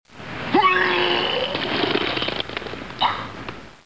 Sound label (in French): Vomissement; bruitage; Liquides